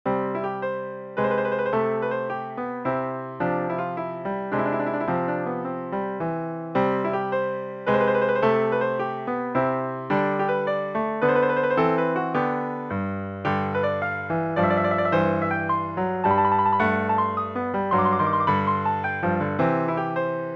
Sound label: Courante